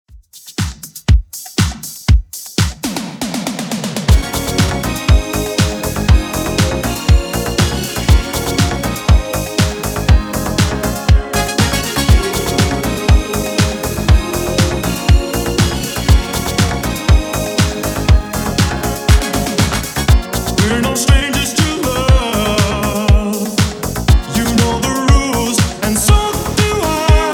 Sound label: Redrum 120Bpm